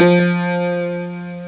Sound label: Synthesizer Piano; konami